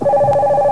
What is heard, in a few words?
ringin; sotc